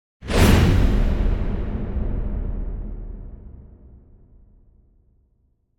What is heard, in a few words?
Impact Whoosh High Metal Heavy - Medium; Hollywood Edge; sound designer's toolkit v2